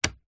household heater fan small speed control dial turn 1 click; zapsplat